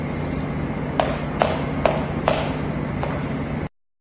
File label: swing kick; ~nankichi